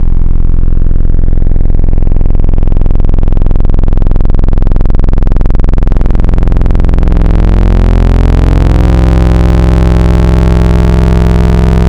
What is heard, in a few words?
Digital Sync House; Synthetic's Serum Collection; Echo Sound Works Core Tables